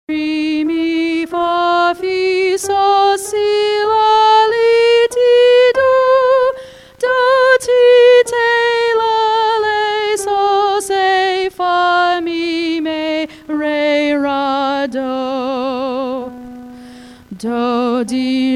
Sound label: Warmup, Vocalization